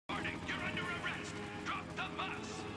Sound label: drop bus